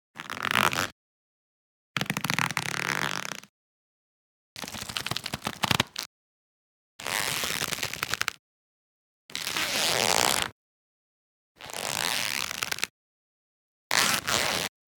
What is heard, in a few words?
leather scrunch jacket biker; Sonniss GDC